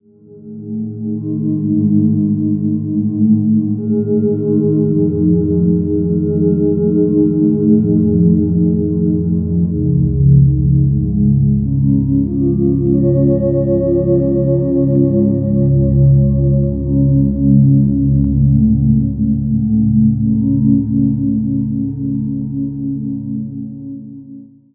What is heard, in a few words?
Ambi Cloud, Keyboards; Sonic Foundry (Sony Creative Software); World Pop